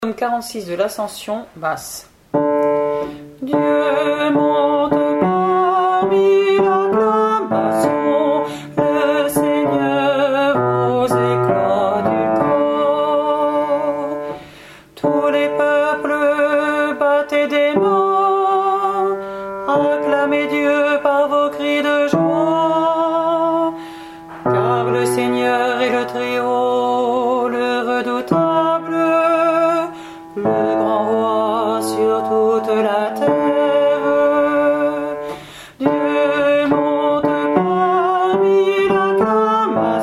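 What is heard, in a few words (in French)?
Psaume Dieu monte parmi l'acclamation Basse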